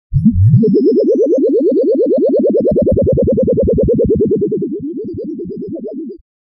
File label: surprise sound